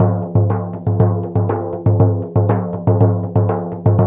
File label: 120 Berim. Samba; Spectrasonics - Supreme Beats - World Dance